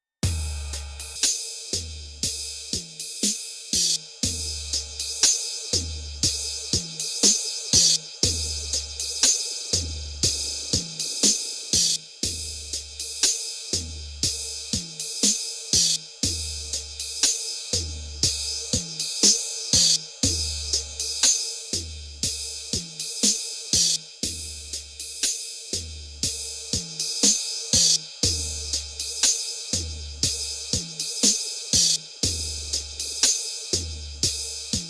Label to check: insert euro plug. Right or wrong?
Wrong